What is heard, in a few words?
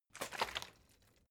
bottle water sparse shake single soft; gastronomie; berufe